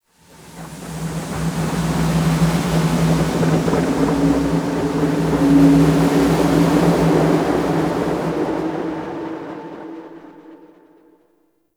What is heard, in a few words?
Exterior Gust; Ambience; Empyrean; Warframe; Railjack